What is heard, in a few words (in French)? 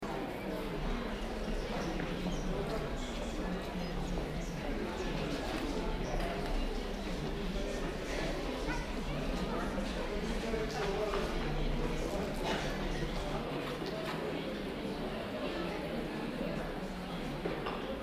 son d ambiance